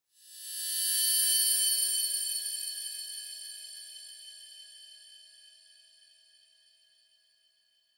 Feedback Swell Ring Pulse, Shimmer Swell Metallic Ring; Swell Sound Effects